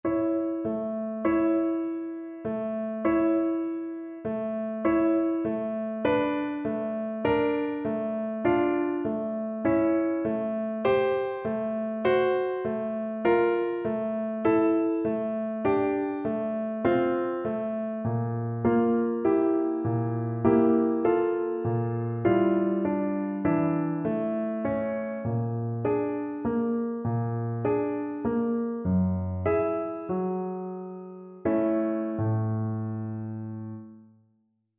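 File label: romanian folkdance VLC karaoke, Cello; bartok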